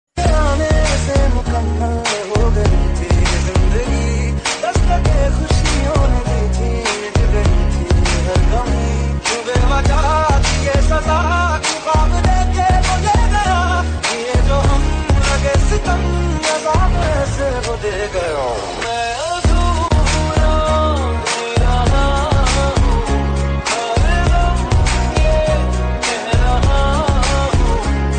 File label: bollywood sad mashup songs hindi